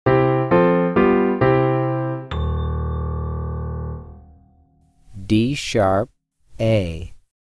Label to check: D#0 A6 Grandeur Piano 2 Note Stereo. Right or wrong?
Right